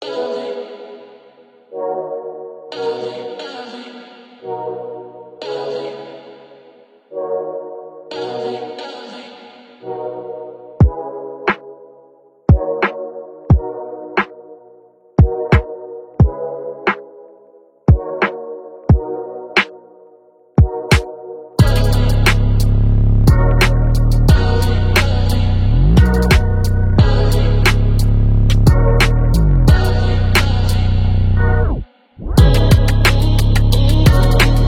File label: Loops Latenight RnB